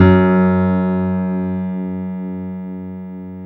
PIA MICRS, MICRO-PIANO

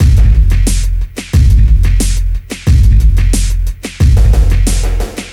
Noise Beat; Drums & Drones

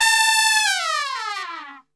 A 2, Brass Super Section, TRP; Best Service; TRP LNGFLL; 90 s Sample CDs

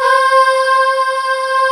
VOX C4; AKAI S-Series CD-ROM Sound Library VOL-2; VOICE

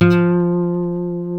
F 3 nylon, Guitars

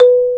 Bass Marimba

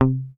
HOFNER MUTE, Bass

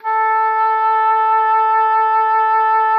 A4, OBOE; Xsample VOL-6

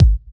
Kick, Kickdrum; Equipped Music; Loungin House

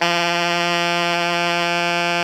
ALTO GR F 2, saxophones; Greytsounds - Philip Wolfe David Crozier's Saxophones; ALTO SWITCH

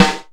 TIGHT SD; Big Fish Audio; Synth City

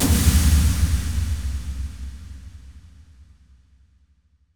Impact; impact samples

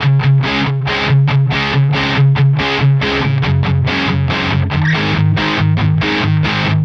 Distorted Guitar 140BPM C#maj; Distorted Guitars; Vengeance - Essential Clubsounds Vol.4; Guitarloops

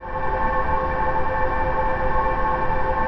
DIGI-PAD

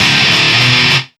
GRAT HARD, Ragga & Dub Reggae; USB Soundscan vol.27; AKAI

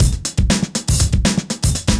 breaks, 120bpm; free bespoke breaks; BeatStyleC

